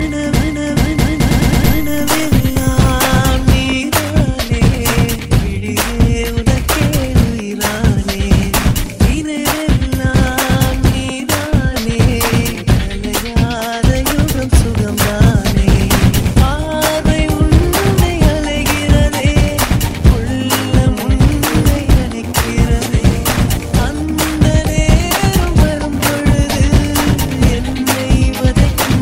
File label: Tamil Songs